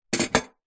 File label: household teapot vintage brass set down on wood table; zapsplat